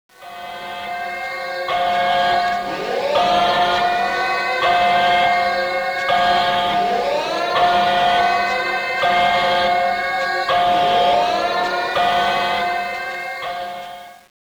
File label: Alarm